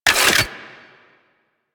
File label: Mech End; DE; Warframe; Weapons; Archwing; Arch Anti Aircraft Gun; Kuva Grattler